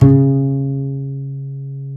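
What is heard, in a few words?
double bass C#2; Univers Sons; Jazzistic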